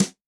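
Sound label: Brush Hit, Yamaha DTXpress-II snare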